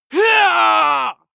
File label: male combat attack long